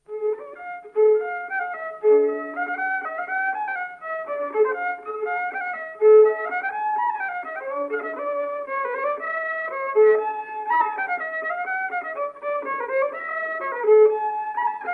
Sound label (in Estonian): Nummerpolka viiul; Urvaste; folk; Otto Hiiop